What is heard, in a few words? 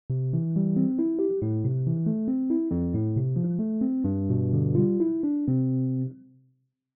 electra comparison; pianoteq